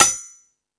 Tool Kit, Drum Machines E-MU Pro-Cussion

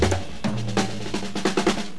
jazz fill; breakcore; Breaks

